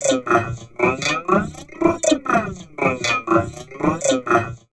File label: VOCOD, Drum Loops Crazy Processed